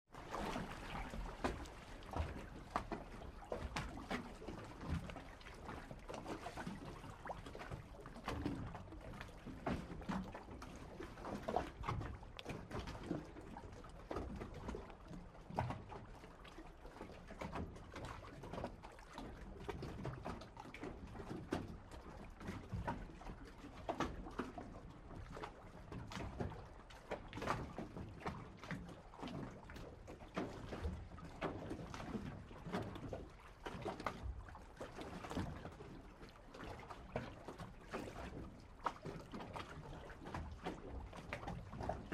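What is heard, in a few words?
water against the bottom of a boat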